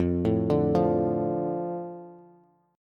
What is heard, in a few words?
F 6th F down Bass; F6 arpeggiated